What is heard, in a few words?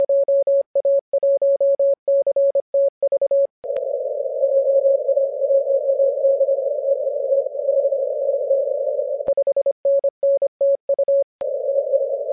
14mhz